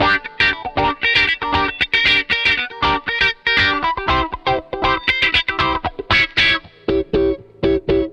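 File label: DISCO guitar WAH, 118 BPM, D; Drumdrops; Compact Disco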